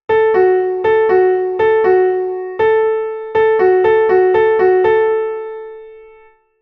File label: eleven minor third; Essentials of Music Theory: Elementary